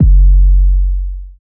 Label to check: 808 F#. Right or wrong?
Right